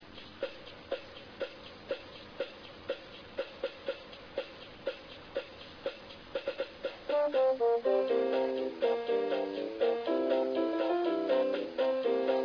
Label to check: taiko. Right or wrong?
Right